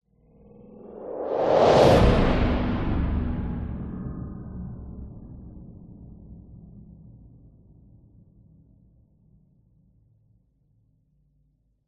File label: sound design whoosh horror growling into heavy whoosh; zapsplat